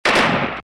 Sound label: Shoot Submachine; Blockman Go; Android